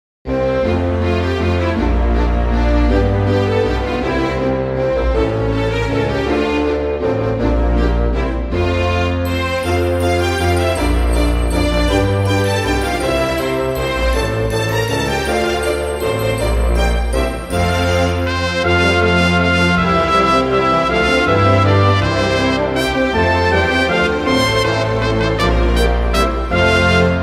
We Wish You a Merry Christmas Instrumental Christmas Music Trumpet Orche